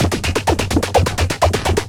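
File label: Sequence Pattern, Keyboards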